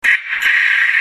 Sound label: jay call, whistle